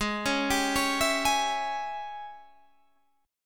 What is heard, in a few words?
G sharp Major 7th Suspended 4th Sharp 5th, Guitar, Half Step; 17,17,18,19,18,17